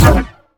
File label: Sciweap Shot Small Blaster Thermal Disintegrator